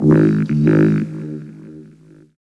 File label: VOCOD, Tekno Industrial; East West